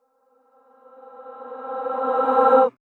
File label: Verse REVERSE FX, 150BPM; Vengeance Samples